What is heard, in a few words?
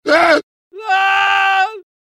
screams